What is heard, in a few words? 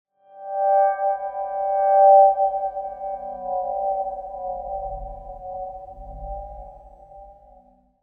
Tone Ring Mysterious Circular Ringing - Beginning; Hollywood Edge; sound designer's toolkit v2